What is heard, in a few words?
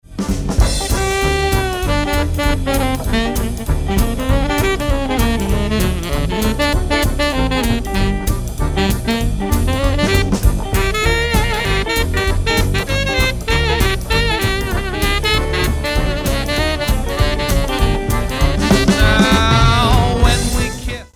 sax, jazz